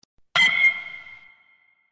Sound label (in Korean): 빠따소리